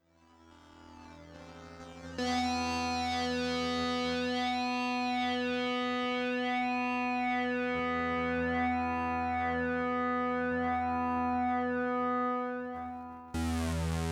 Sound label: B drone